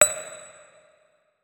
notes, guitar; Hideout Furniture

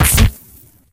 tele damage, poltergeist; Soundscape Overhaul; monsters

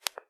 mark adjust; Mark Switch

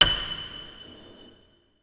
notes, keyboard piano; Hideout Furniture

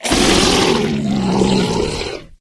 die, monsters bloodsucker; Soundscape Overhaul

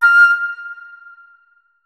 flute notes; Hideout Furniture

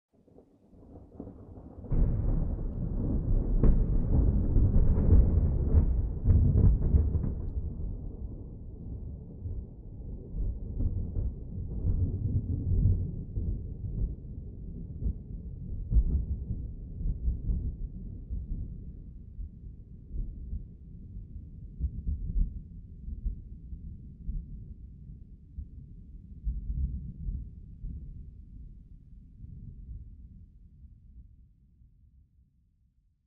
medium, nature; Atmospherics

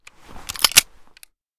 pm unjam; Blindside's Weapon Reanimation and Rebalance - Military; weapons

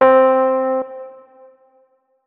notes, keyboard, electric piano

